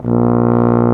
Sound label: BRS F TUBA; Roland L-CDX-03